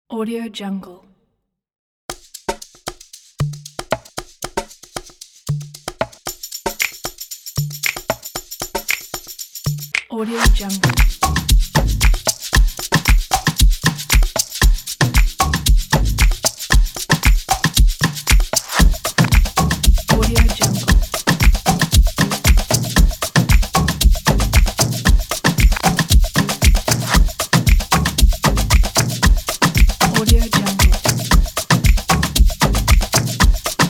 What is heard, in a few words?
african modern pop percussion; dagored